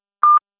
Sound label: Beep; Samsung GT-E1500 Pusha 2SIM; user greet